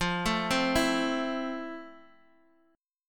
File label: F Major 7th F, Guitar, DADGAD